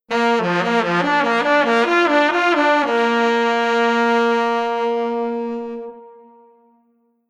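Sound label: Horn Unison Trombone Tenor Sax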